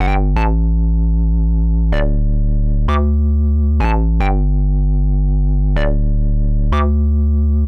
Hard Synth Bass A, 125; PowerFX - Nu Jazz House

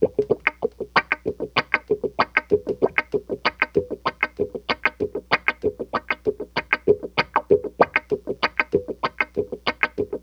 WAH GTR, Funk Gtr & Wah; PlatinumPhattCD2; E-MU Producer Series Vol. 8 – Platinum Phatt

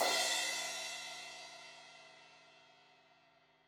Crash 16in edge, Cymbals; Deeply Sampled Drums

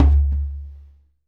Conga Low; Essential Percussion

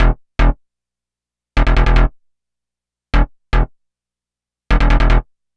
Psycho Bass; Dr. Fink's Funk Factory

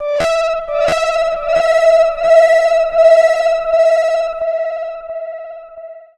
FX DIST; FX DISTO

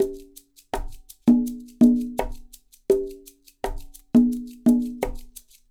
Percussionworks; Sampleheads; NYC Percussionworks; 90 s Sample CDs

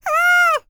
PERSIAN, World Voices; ILIO; Vocal Planet VOL-5

